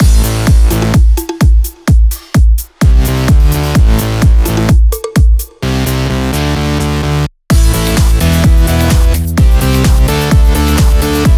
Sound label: Diesel Mix, 128BPM; VFH1 128BPM Diesel Kit